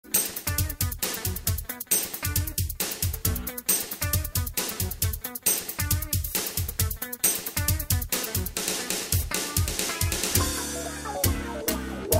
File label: groove